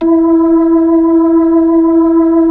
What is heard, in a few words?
B-3, Keyboards of The 60's and 70's; Club Xfade